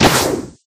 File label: Bow; life of a naomi; Naomi Carrigan